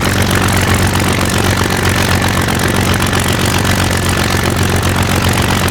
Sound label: Aircrafts Engines Jumo213A; DCS